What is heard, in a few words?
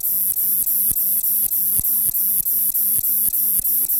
song, katydids, neduba; katydids neduba steindachneri